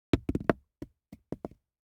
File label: DICE on Hard Wood, Throw and Roll, Foam, One Die; Samples; ArticulatedSounds; DICE Sfx Party (Extended)